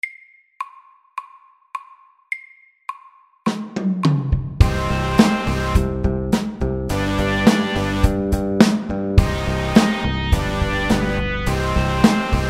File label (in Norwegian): Electric rock MIDDELS